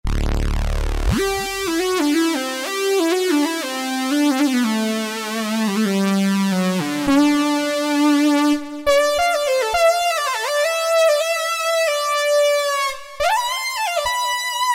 SL, Yamaha TG77; Factory Presets

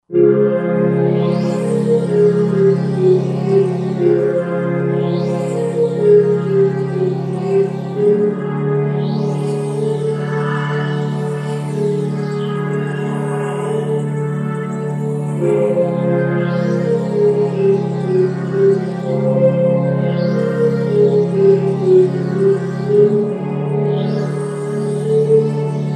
Rotary Pad; Roland JV-2080

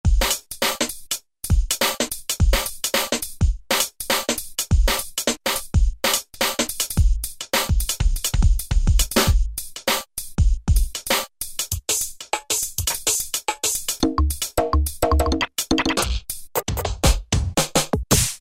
Drum, E-mu Morpheus; NeuJacq

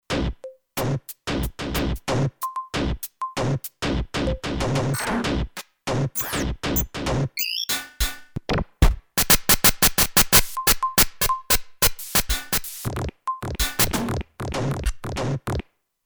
Industry Set, Kurzweil K2500X; Factory Programs

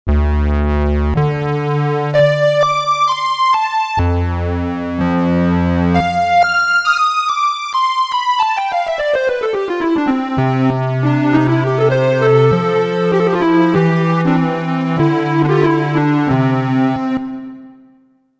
Square Lead, Roland JV-880; Factory Patches

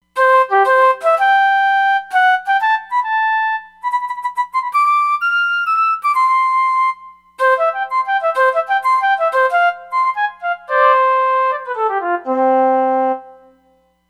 Flute, Roland Fantom XR

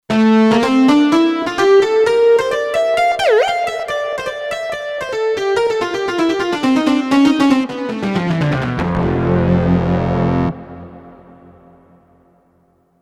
Synthitar Lead, Kurzweil K2500X; Factory Programs

